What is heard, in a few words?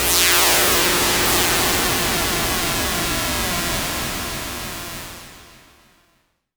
Downsweeps